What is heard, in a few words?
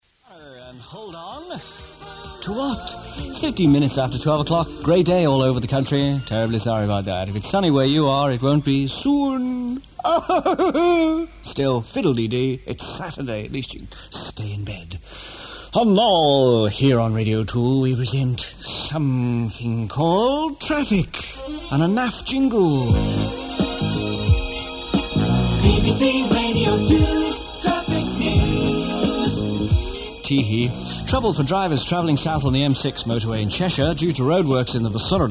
JINGLE